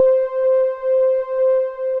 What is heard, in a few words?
Ambiento Bells, Synth Keys; Wavetable; Ableton; Synth Essentials